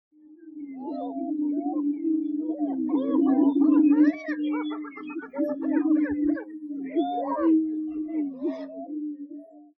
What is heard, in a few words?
Prairie-chicken Greater